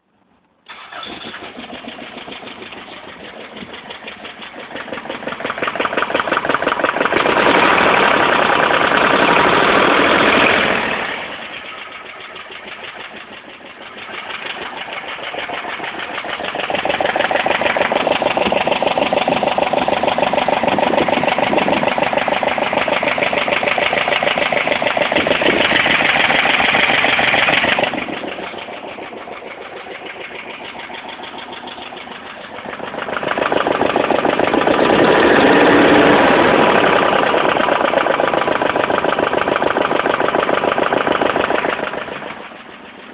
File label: engine suddenly rough choppy feel